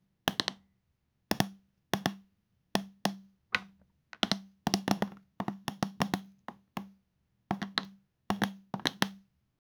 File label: picking up a small plasti; interaction